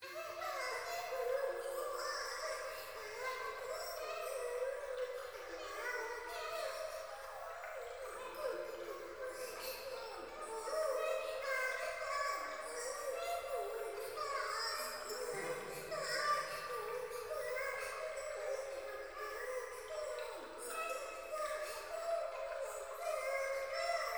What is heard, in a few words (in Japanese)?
風呂 可愛い喘ぎ 川のせせらぎ, ASMR